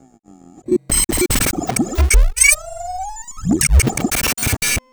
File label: glitch thing